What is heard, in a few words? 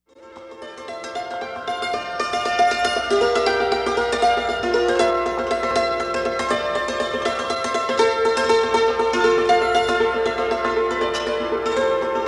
waltz, reel; First ODPC meeting